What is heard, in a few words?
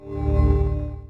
scream warning; pmd2